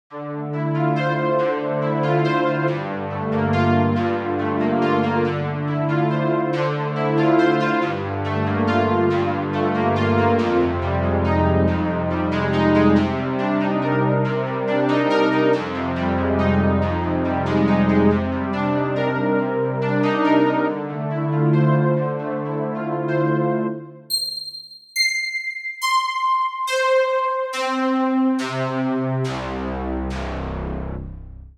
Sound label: Noise Saw, struck instrument simulator